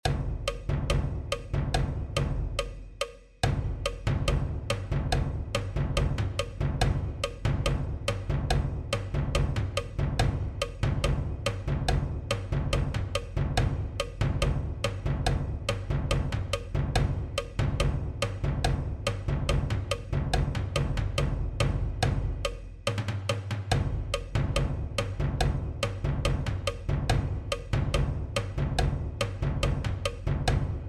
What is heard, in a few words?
perc; percussion